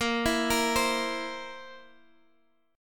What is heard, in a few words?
Bb Suspended 2nd Flat 5th, Guitar Half Step Down